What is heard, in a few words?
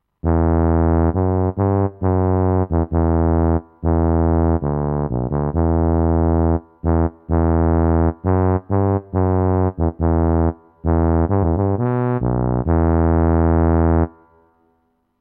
tuba main melody